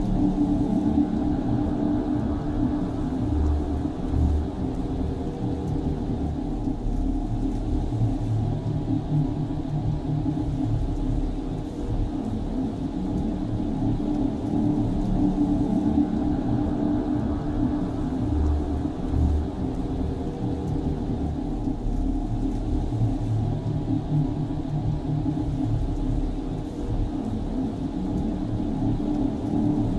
ship battleship idle; Stellaris; machineage

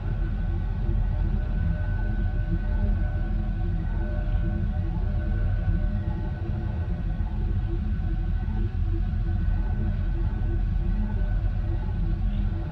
destroyer hum, ambient; Stellaris

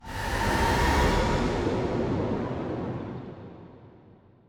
nuked planet; ambient; Stellaris

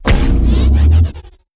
click upgrade starbase; apocalypse

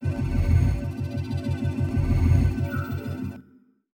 scanner; Stellaris

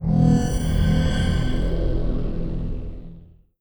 notification uplift; Stellaris

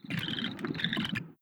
arthopoid greetings; Stellaris

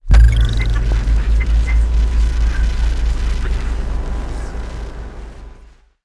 encrypted comms; Stellaris; nemesis

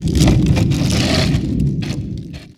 event crystal ship; Stellaris

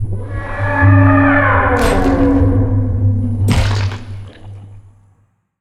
elder tiyanki death; Stellaris; distant stars

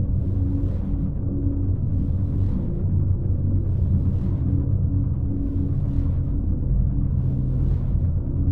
ancient drone station idle; Stellaris; other entities

